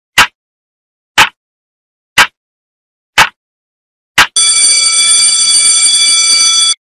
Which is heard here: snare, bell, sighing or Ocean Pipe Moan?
bell